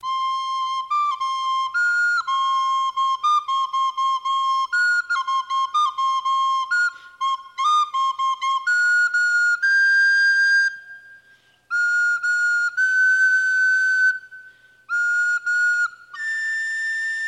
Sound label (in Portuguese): flauta doce